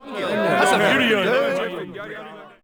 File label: men murm